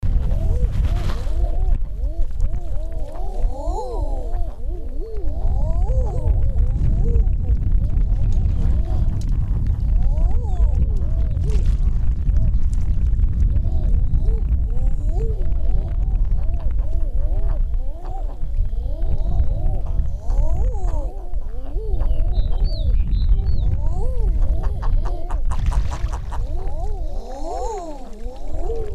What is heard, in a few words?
eider duck, svalbard